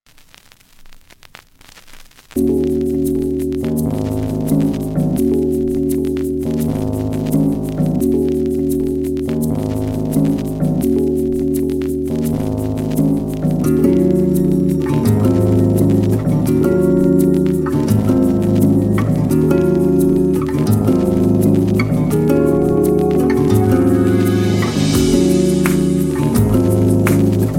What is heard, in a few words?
Instrumental